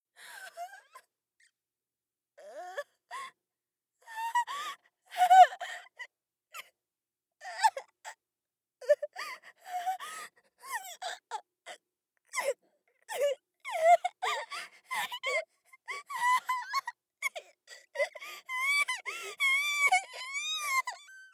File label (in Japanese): 恐怖; 効果音なし